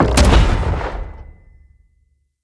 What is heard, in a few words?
Siege Engine Cannon Fire; Weapons; Enemy; Artillery; Ode Siege Engine